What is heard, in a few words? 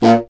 C. Bassoon, solo instruments